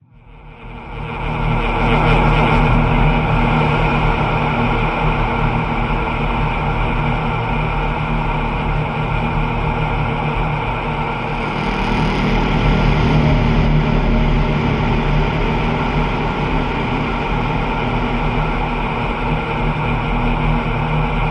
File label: Echoes Locust Pad